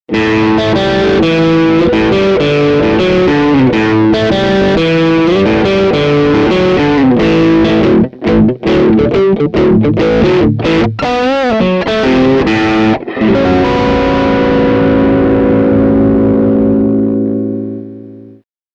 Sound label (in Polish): Squ Przester SG, single; classic strat single